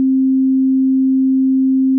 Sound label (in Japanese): C4 264, 純正律, サインは; 平均律と純正律; 単純音